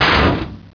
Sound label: DEBRIS